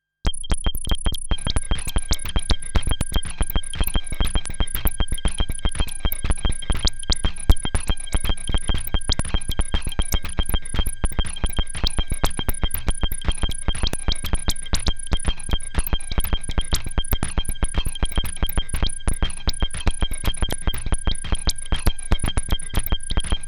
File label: monofone synthesizer beats; Bere und Tete Session